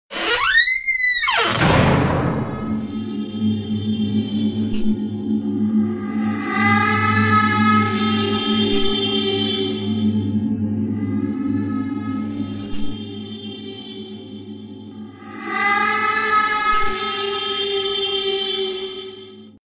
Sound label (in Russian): pugayushij rington